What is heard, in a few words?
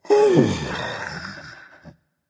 content berserker; cuchulainn